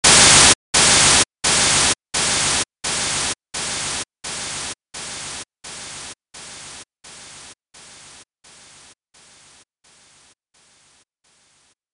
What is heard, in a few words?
noise by 3 dB